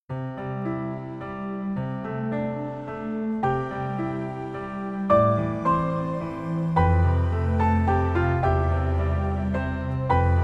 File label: Piano Solo